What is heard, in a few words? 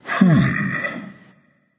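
non verbal thinking, voice; cernunnos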